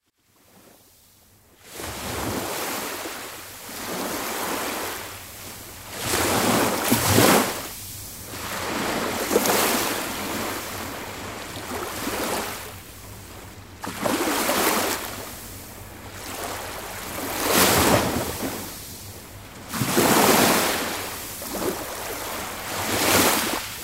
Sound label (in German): Meeresrauschen, Naturklaenge